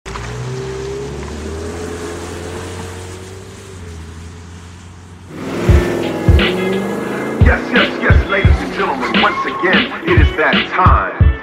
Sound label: Punjabi ringtone